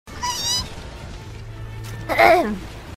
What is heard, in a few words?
hiii ehem